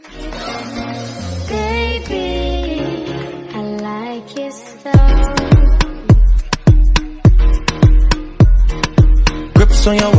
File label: English ringtone